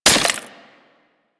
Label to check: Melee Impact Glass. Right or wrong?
Right